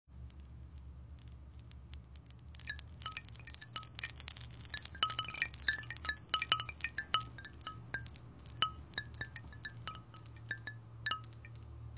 Breeze, Ringtones; Nokia; Asha 201

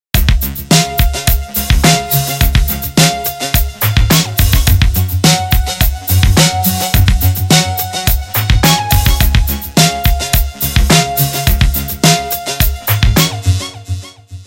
Vibe, Nokia Tones; Windows Phone apps

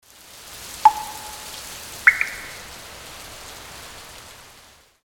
Rain, Message tones; LG LN272 Rumor Reflex

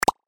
notification beep sound; Xiaomi Mi-CC9

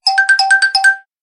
Cartoon, ringtones; Huawei P20

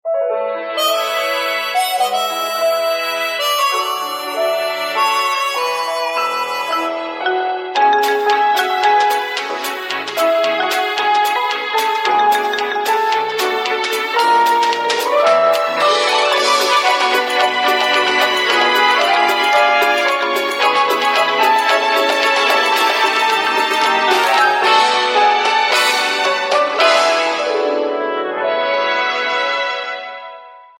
Ringtone, Samsung GT-S3310

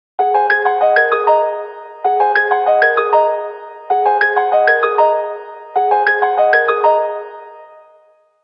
piano

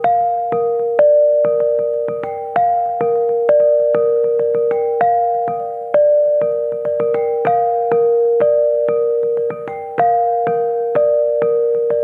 alarm dulcimer; Mudita Pure

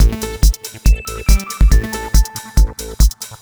teckno, ringtones; Google; NewWave Labs

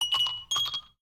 Ice Cubes, notifications; Galaxy

